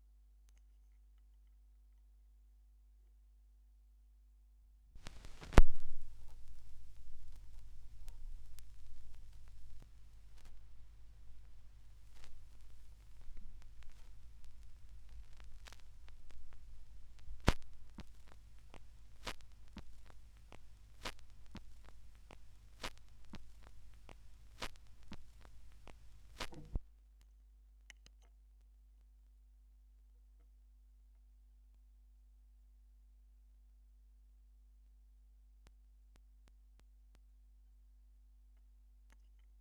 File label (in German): schallplattengeraeusche